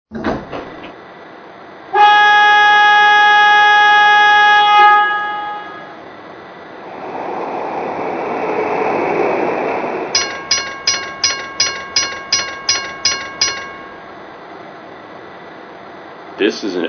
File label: PCC CAR, trains; MUNI